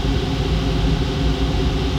Inharm, Noises; Xfer Records Serum; Serum Presets; FP Inharms

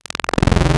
PWM, Analog; PWM Maths 2; Xfer Records Serum; Serum Presets

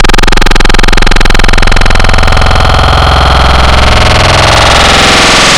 Thin Thick; Xfer Records Serum; Serum Presets

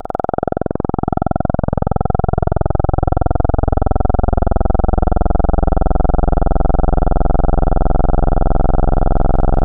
PWM Reso, Analog; Xfer Records Serum; Serum Presets

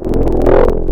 Dist Fwapper SQ; Xfer Records Serum; Serum Presets

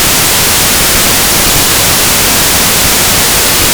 Noises Analog; Xfer Records Serum; Serum Presets; AlphaNz

